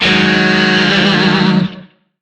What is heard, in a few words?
Shawzin Yo Chord E; Music; Warframe; Lizzie Shawzin